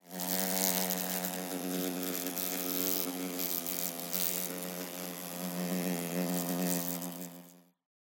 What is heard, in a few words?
amb flies buzzing; Half Life Alyx